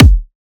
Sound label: Riddim Kick